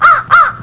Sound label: bird